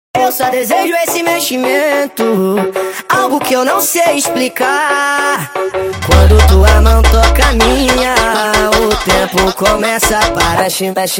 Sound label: FUNK Sped Up